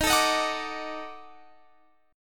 D sharp Minor Major 7th Flat 5th D sharp down

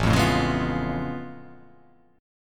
A Augmented Major 9th A down

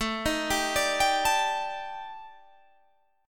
D sharp Major 7th Flat 5th A, chord

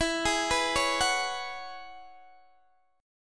E 6th Add 9th E down Guitar Open D

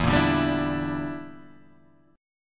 G Minor 6th E

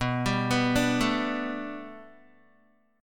B Minor 7th Sharp 5th B Guitar, chord; Bm7#5 arpeggiated

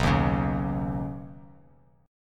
B Major Add 9th B Bass Standard; Badd9; strummed; x,2,1,6

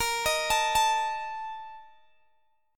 A sharp Major 7th Suspended 4th, Guitar Open G

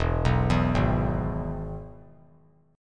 E-Major E; arpeggiated; JGuitar

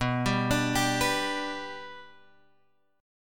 B-Minor Sharp 5th, Guitar, Drop D

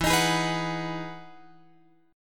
E Major 9th E, chord

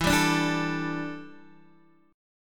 E Minor 11th guitar chord, DADGAD

